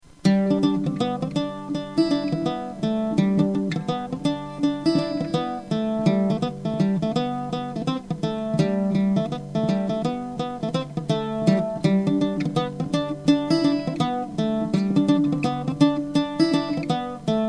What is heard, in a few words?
gavottes